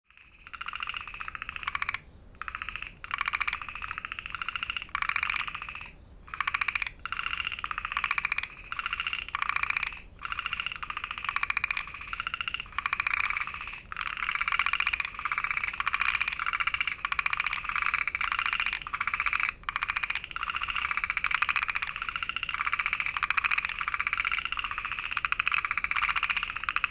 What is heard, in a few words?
himeamagaeru call, frog